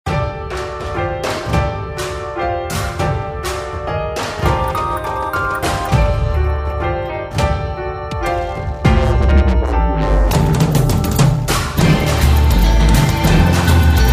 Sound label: Battle BGM